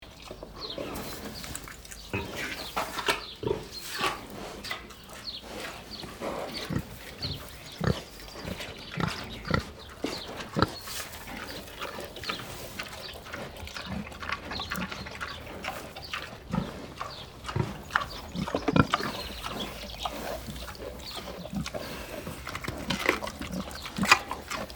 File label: Farm pigs mud activity ambience loop